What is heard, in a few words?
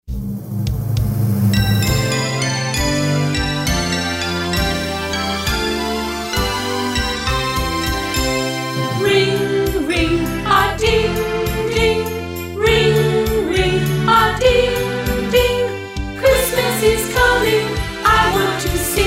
ring ring a ding ding